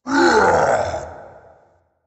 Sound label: grunt, firegiant; smite